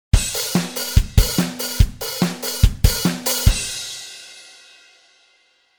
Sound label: 16 Fast